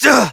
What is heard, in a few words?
Generic Short Pain Operator Voice; Operator Mission